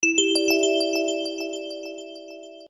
Glass

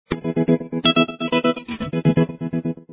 Ringtone; BQ Russia BQM 1402 Lyon

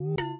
ui error, ui sounds; Motorola AURA R1

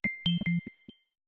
Glitter, notifications; Samsung Galaxy S26 Ultra

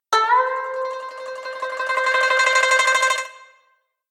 Zither, notifications; Lenovo; P700i

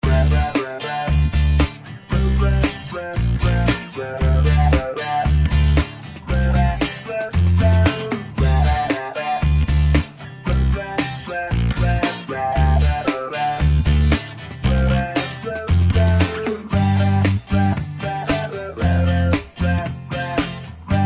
Tone, phonetones; Telecom Personal

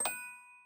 Lock

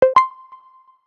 Blink, notifications; OnePlus; OxygenOS 13

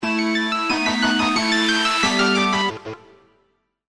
Red Alarm; Sony Ericsson P900

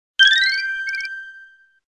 Skitter, Nokia Alerts; Windows Phone apps

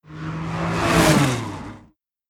Stereo Race Car, notifications; Lenovo; ZUI 13

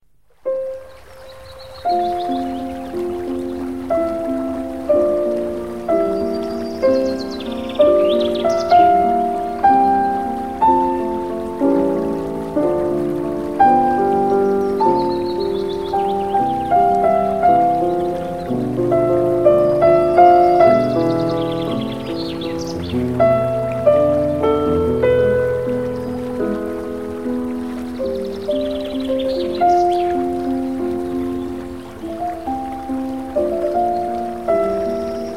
sleep Music; ZTE Nubia Z5S Mini; ui

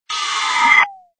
Swoosh Close; Kyocera; KX2 Koi; flip

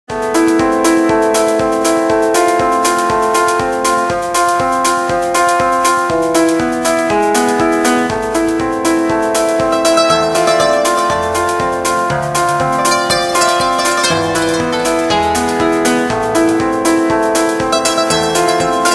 Ring Romantic; Microsoft; Windows Mobile ringtones; Ring Tone Pack 7

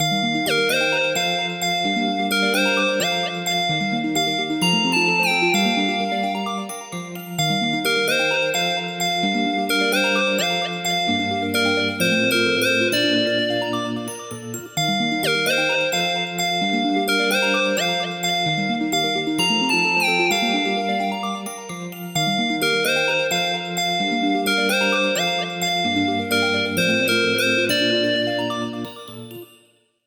Chic Life Is Good Violet, ringtones; LG; VELVET 2 Pro

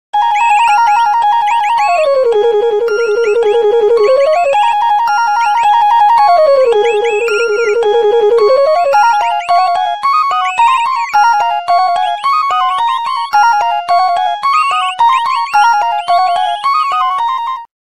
Clock Cadenza, Nokia Tones; Windows Phone apps

